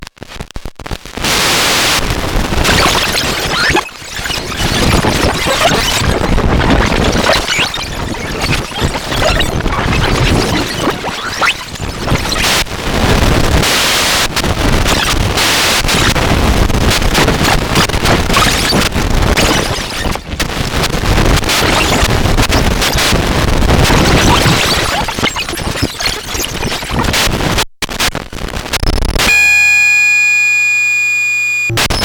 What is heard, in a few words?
kawai ms20, circuitbending